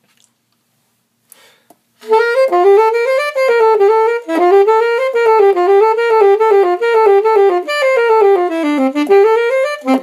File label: alto sax